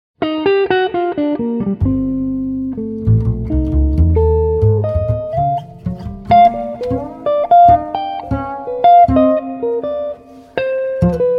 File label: c minor blues chase